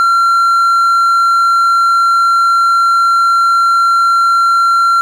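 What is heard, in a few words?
sounds beep beep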